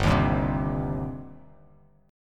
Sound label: G Suspended 4th Sharp 5th G down; strummed